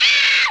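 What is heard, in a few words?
SCREECH; AB3DII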